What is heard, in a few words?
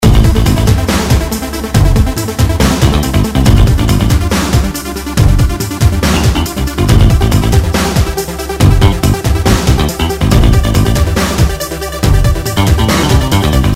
loop